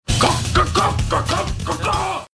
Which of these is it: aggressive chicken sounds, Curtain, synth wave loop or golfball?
aggressive chicken sounds